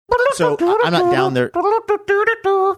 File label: singing funny song